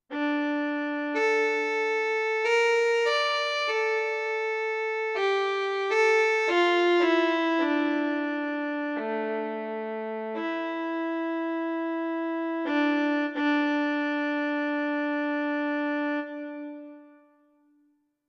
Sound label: Viola